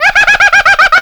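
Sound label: MANIAC LAUGHT; AMG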